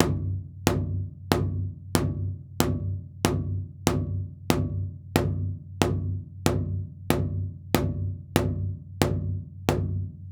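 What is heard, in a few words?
Big drum plastic mallet; Nightfall (Tabbyewik)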